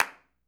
clap, Roland MV8800; REALCLAPS

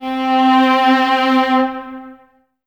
String Ensembles, Violas, VLS Detache

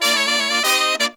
Pop & Funk Brass

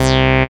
SYN TB303, Roland

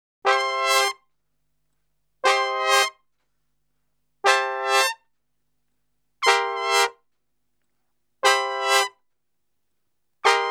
Salsa Picante; Masterbits; World Zone VOL-3

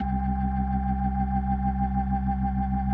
C2, B3

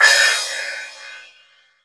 crash L; Zero-G; Chemical Beats; CRASH ZOO

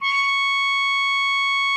HARM C#6, TRUMPET; Session Instruments; Best Service ProSamples vol.42

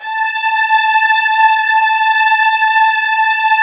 A3, Strings; ILIO; Kirk Hunter Virtuoso Strings VOL-3; STR TAME

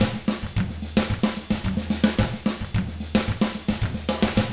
110 SMBA samba; ILIO; Double Platinum Drums 1

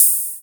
Quasimidi 309, Drums

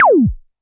korg trident

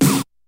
KICK SNARE; Kid Nepro; Elite; KICKS SNARES

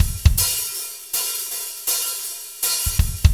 Fast Shuff, Drumscapes Roland; Northstar

